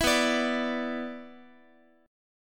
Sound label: B Minor Double Flat 5th B Guitar, chord; Bmbb5 strummed; JGuitar